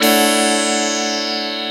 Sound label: Quadra Pad Major; Keys; Pads; Drum & Bass